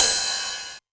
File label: 909 Ride; Novation Drumstation